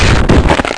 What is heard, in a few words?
hornet hit, monsters; AoMClassic